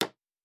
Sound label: Nail; Fantasy Sounds Bundle; Craft